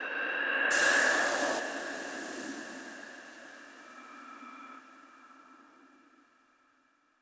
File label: Overlay Dark; Benefactor